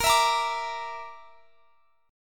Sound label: A sharp Major Flat 5th; A#Mb5; strummed; x,x,20,21,23,22